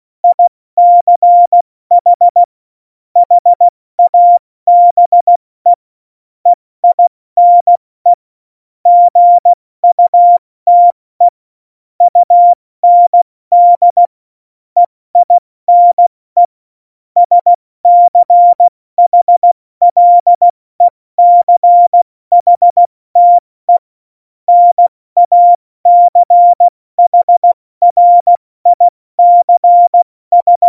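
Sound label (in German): Witz, Morsekurs, cw morsen; Witze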